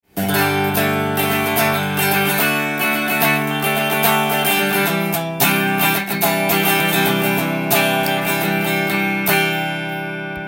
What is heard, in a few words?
fender usa 61